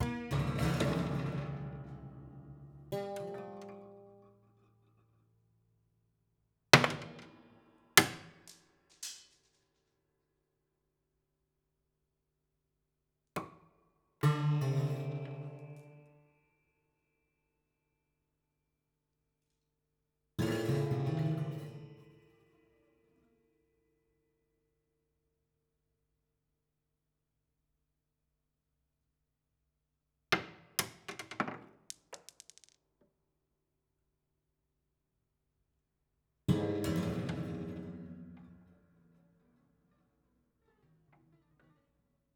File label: Marble Lazy Bounce, Piano Destruction